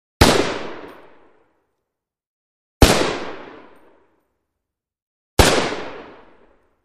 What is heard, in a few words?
Hk91 Single Shot